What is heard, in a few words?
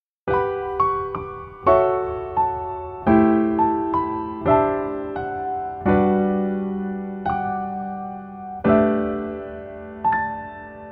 Piano ver